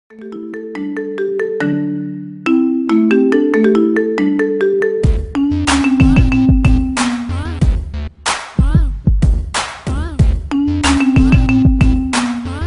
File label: marimba ringtone remix